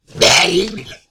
Better Zombie

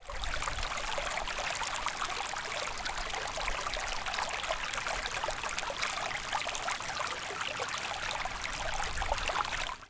A Small Brook In A Forest

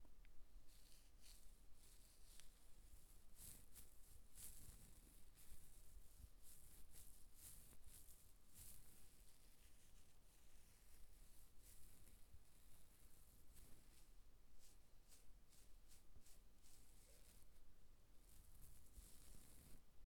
Beard Scratch

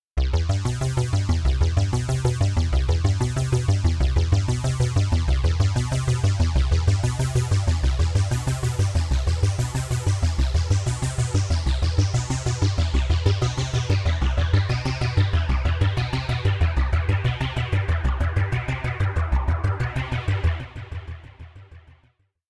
Arp; Stranger Things

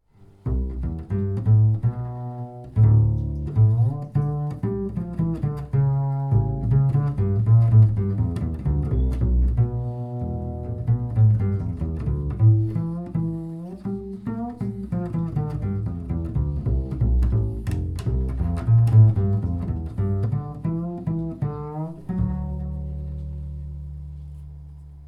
Double Bass Test

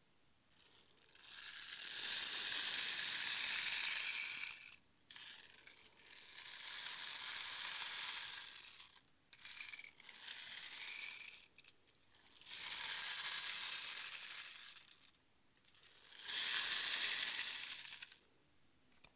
Rain Stick Fast

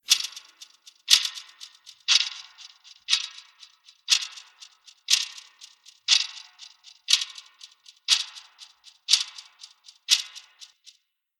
Tick Tock Echoing With Quarter Ticks

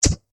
Swoosh Wobble

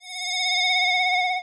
Retro Vampire Apparition Or Vampire Awakening

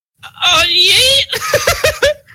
Aaah Yeete

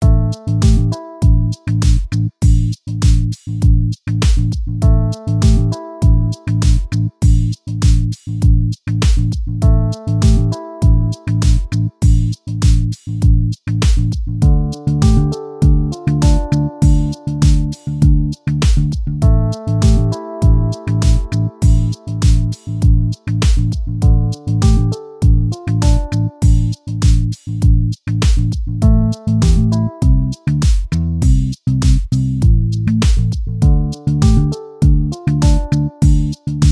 Piano Bass Drums Background Loop

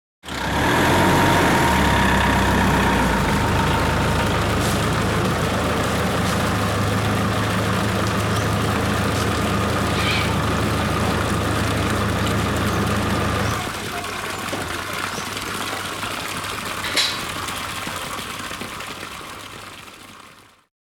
Jeep Reversing

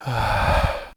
Breath

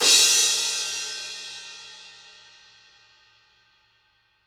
Crash Hhxplosion 18 High Vol

